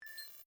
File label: button small click